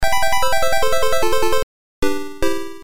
arcade game over; super qix

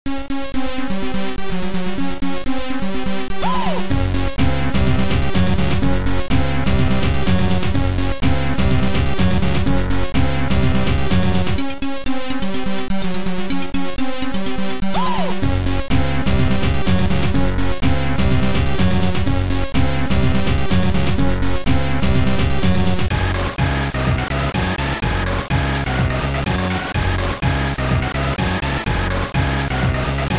techno party

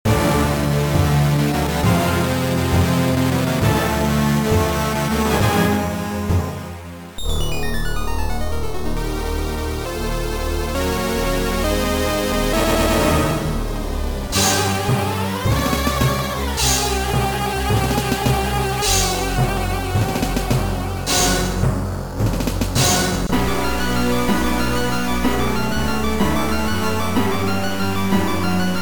game complete

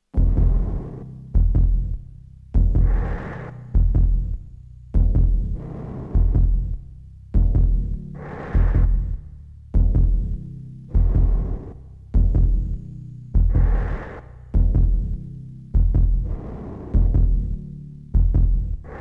coin; intrigue

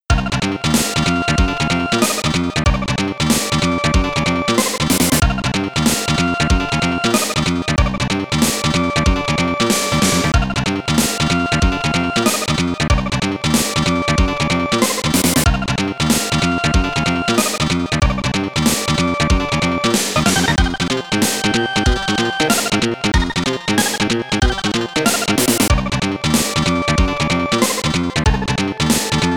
game over name entry; altered beast amiga

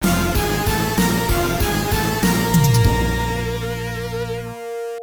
arcade game over; vendetta

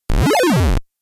jump

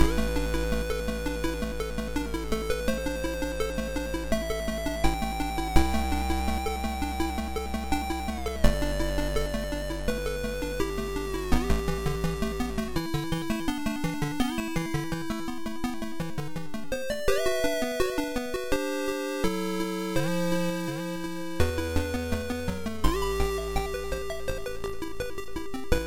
game menu

